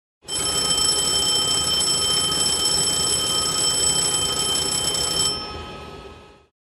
Flight Deck Phone Ring

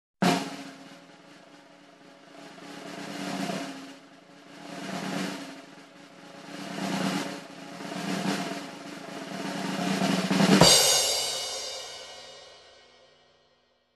Snare roll tension buildup

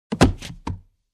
Carton Boxes fall down

